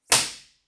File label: impulse response